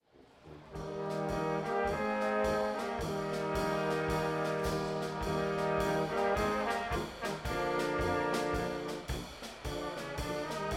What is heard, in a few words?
polka